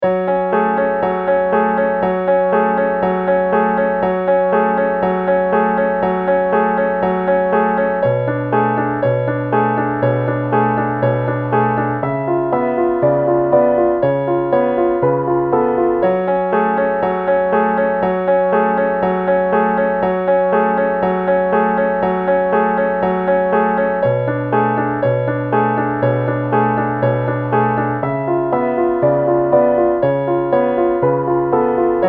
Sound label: piano